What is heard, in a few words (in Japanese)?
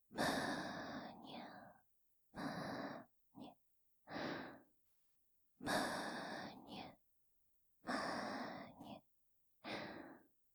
むにゅむにゅループ; お姉さんの爆乳パイズリに溺れて抜け出せなくなるお話～おねショタから貢ぎマゾまで～; 妹すたー; 安眠用なでなでむにゅむにゅループ